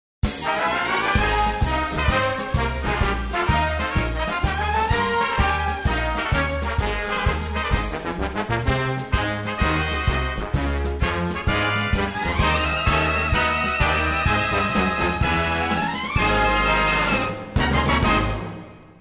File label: playoff